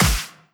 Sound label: soft hit clap; osu